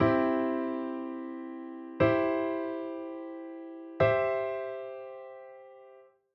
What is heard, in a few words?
hikigatari chord